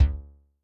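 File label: bass drum bass, Kick, Yamaha DTXpress-II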